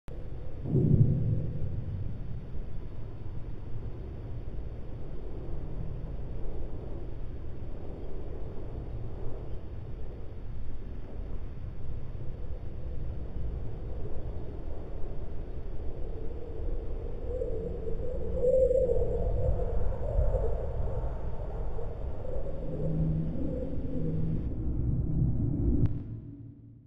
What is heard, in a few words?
Signal; Dione